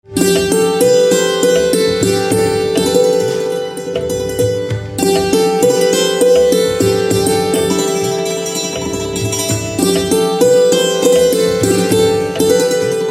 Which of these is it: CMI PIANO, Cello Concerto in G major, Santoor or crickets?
Santoor